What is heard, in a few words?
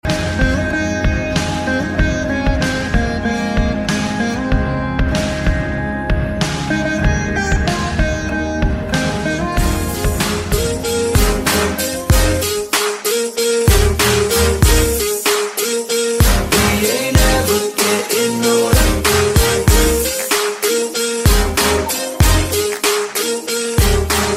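Instrumental Ringtone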